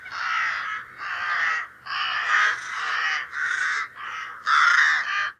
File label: crows sitting in the trees; salamisound